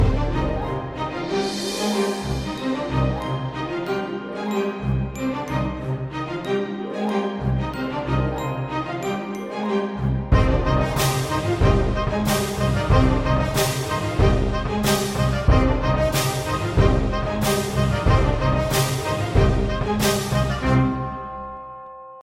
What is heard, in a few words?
fight bgm; Epic Toon FX